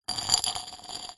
foley glass jar slide concrete short

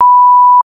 sound design beep censor tone

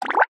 cartoon bubbles

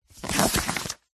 book workbook page turn; zapsplat

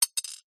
household pill tablet single drop on glass; zapsplat

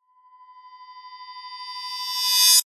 sound design riser metal high pitched reverse

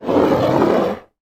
household aftershave bottle scrape across table

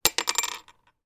office plastic pen lid drop on table

